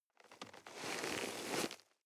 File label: nylon leather soft